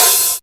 piercing open hat sample f key, Royality free open high-hat sound tuned to the F note